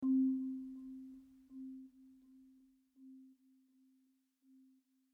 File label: Marimba and Drums Mood